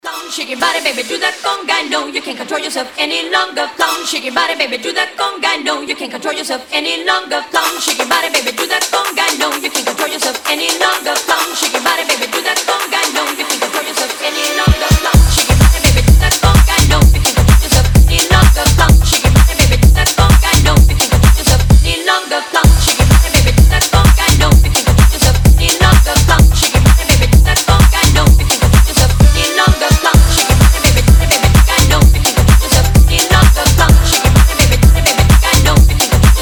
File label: conga